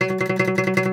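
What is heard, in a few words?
OUD tremolo, Plucked Strings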